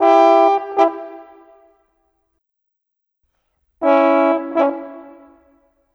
Horns, Orchestral, Rock Pop; Sonic Foundry (Sony Creative Software); Orchestral Series VOL-4; Rock and Pop; Rock-Pop 19

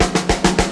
LOOP, ROLLS; X-Static Goldmine 2

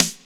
DANCE SET, DRUMS

